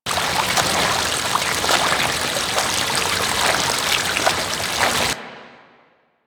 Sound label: Kraken Tentacle Drip; Warframe; Warframes; Pirate